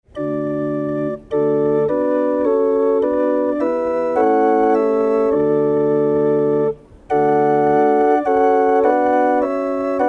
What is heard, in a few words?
hurdy-gurdy